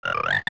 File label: Frog